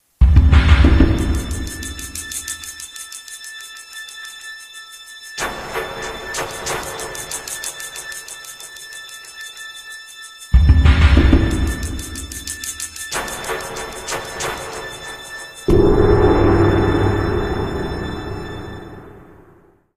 stinger

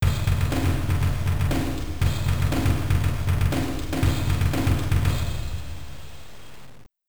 Ditty; MLB Slugfest 2004

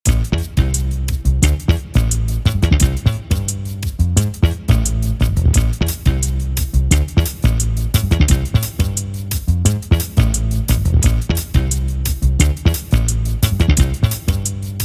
Exercise Practice (87.5 Bpm)

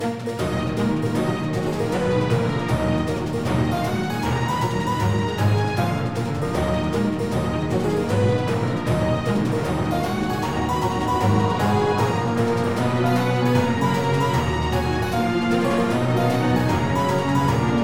Combat Loop; Music Shelfishrocks; Curse of the Sea Rats

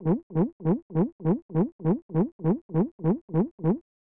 Eraser; Fun 'N Games; SNES; Picture as Food

